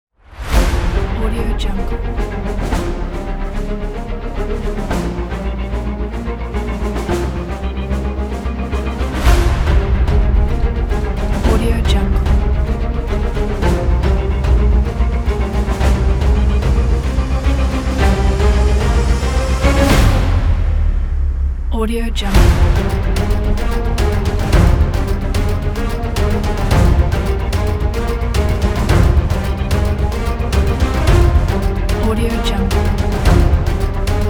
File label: epic victorious dramatic orchestra; music2noise